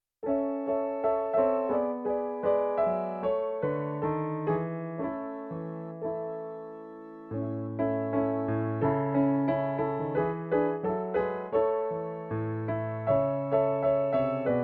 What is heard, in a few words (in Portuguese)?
playback hino